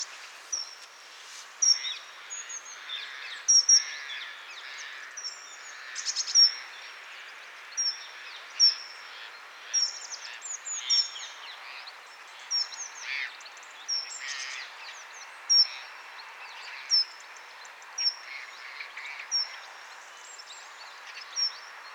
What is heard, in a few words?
Dunnock Prunella modularis